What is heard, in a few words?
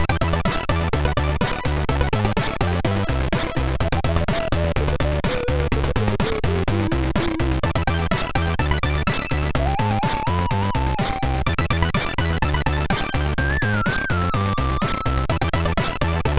Test, MOS6581; hvsc